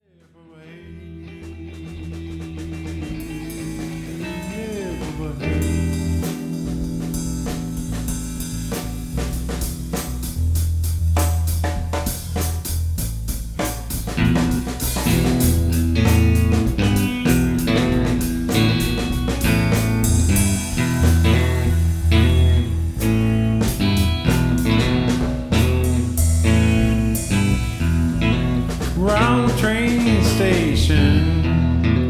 train blues; r1 studio sessions

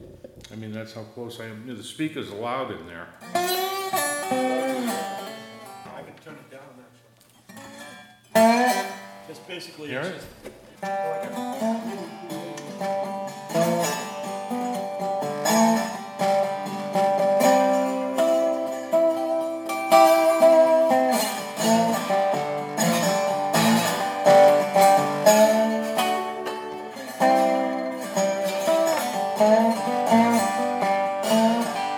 Dobro

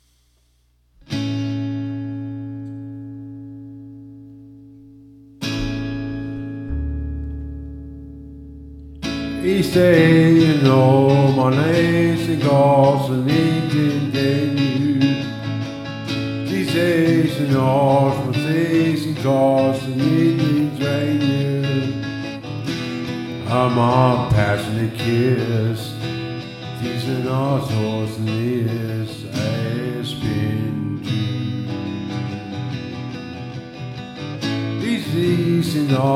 Jam; r1 studio sessions

